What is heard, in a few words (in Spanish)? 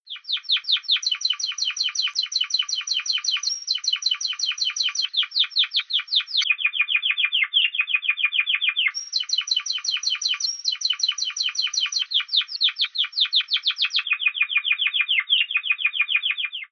Chau Chau, timbrado español; θετικές νότες